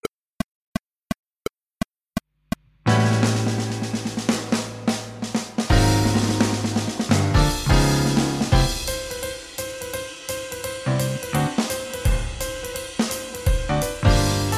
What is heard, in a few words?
With drums